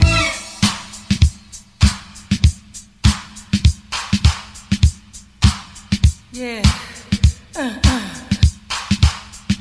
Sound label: 100 bpm modern drum loop A key, Free breakbeat - kick tuned to the A note. Loudest frequency: 1387H